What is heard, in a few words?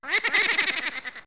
Poe Laugh; Ocarina of Time; a sped-up version of Ganondorf's laugh